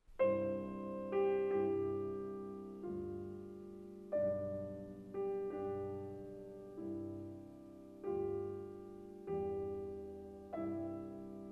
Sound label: Piano Sonata No. 32 in C minor; Wilhelm Kempff; Beethoven; Piano Sonatas